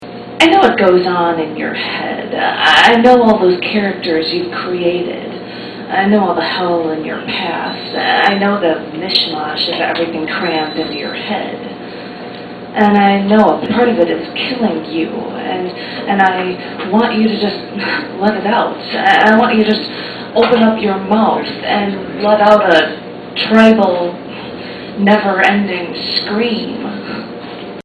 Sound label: tribal scream cafe